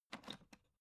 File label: alu case small handle